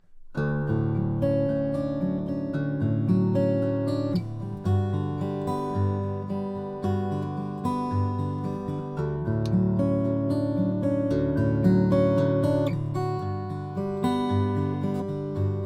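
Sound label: GA koa acoustic guitar; mccollum; cedar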